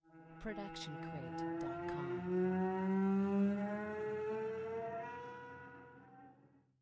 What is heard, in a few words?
Cello Bends Gliss; SoundsCrate